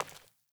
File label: Sandals Walk Default, Footsteps; Characters; Player